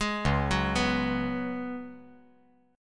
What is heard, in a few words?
C sharp 7th Suspended 4th C sharp